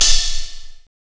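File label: OHH, open hi-hat; E-Lab; X Static Goldmine 1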